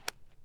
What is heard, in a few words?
splat grass; splat grass clip; splats